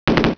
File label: WDK Bullets; The War Engine